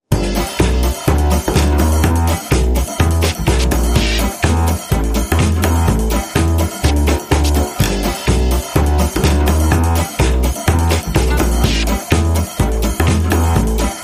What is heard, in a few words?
Duck Quack Splash